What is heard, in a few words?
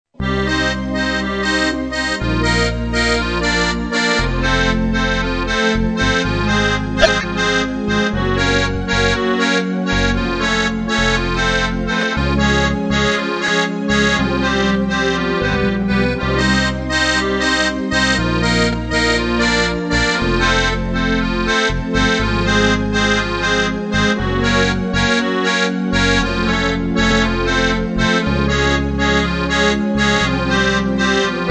guitard